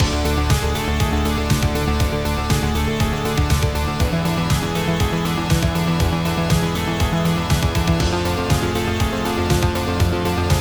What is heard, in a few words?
Song Loop; Endocrisis